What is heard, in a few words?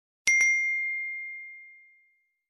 Iphone Double Ding